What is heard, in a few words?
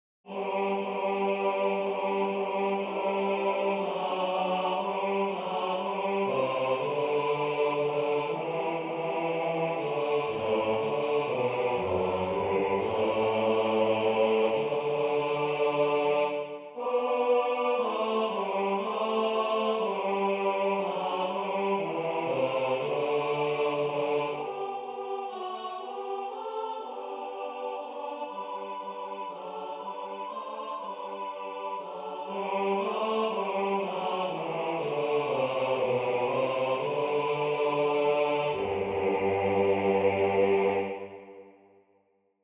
Bass; O come, all ye Faithful